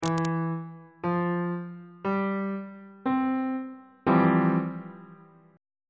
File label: f major 7th suspended 2nd 3rd inversion on bass clef